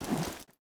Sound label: sprint end; STALKER; IWP